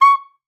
C#6, SAXOPHONE; Session Instruments; Best Service ProSamples vol.42

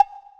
Cowbell; Equipped Music; Loungin House

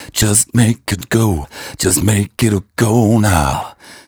m 130 mel d-flat, Vocals

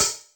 H, Hi-Hat, Yamaha DTXpress

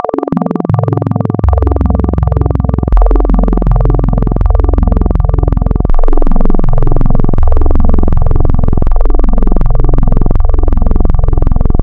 Add BinAdd Fib, wavetables Additive; Architecture 32

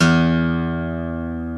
PICK MART

